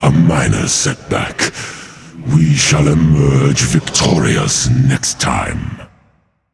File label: boohoo; smite; thor